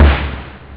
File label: nature, snare; OctaMED SoundStudio V1